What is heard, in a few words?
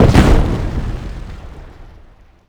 medium impact generic; WAR